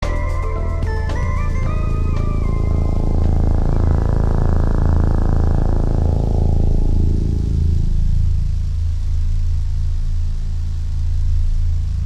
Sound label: resurrection loop; celeste